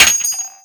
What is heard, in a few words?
shell heavy metal; STALKER; IWP